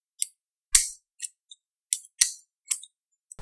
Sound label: equipment click